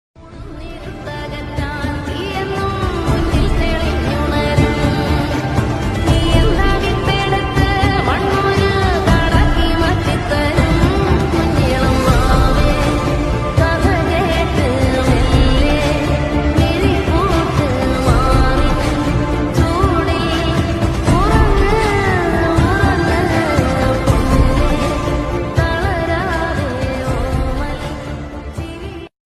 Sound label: climax bgm ringtone sad bgm ringtone emotional bgm ringtone; tovino thomas